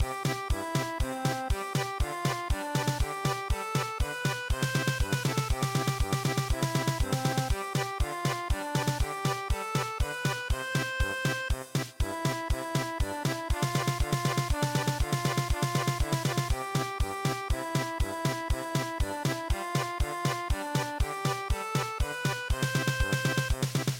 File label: German Polka